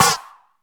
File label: short reverb snare f sharp key, steel snare drum tuned to the F# note